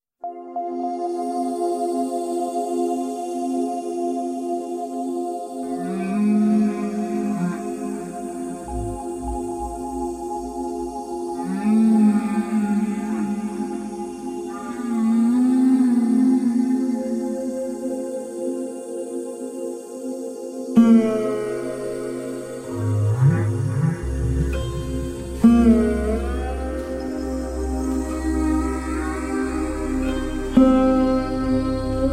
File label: Whale Meditation